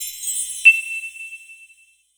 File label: button